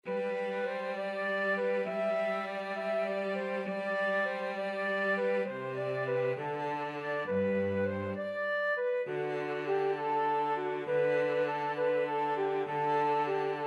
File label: pastorale, flute cello duet